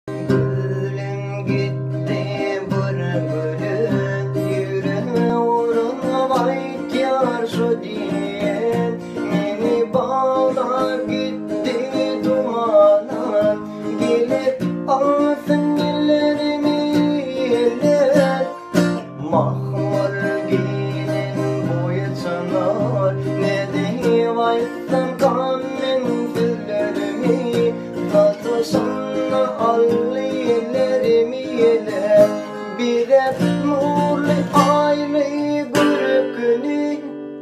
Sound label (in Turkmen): Turkmen Gitara